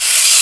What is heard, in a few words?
INST FX, Ragga & Dub Reggae; USB Soundscan vol.27; AKAI